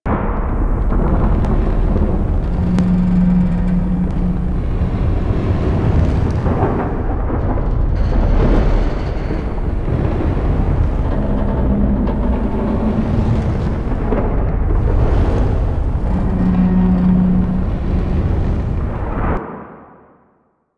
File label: Void Dragon Distant Loop; Duviri; Warframe; Citrines Last Wish